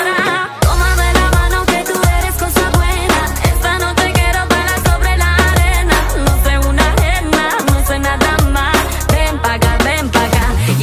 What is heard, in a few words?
arabic ringtone